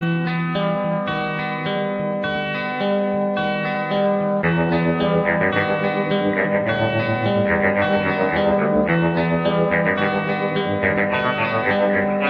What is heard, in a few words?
tune